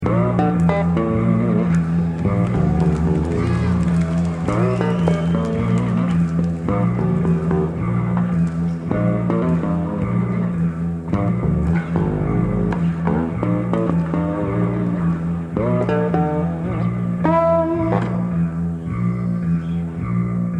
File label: Bass And Drum Improvisation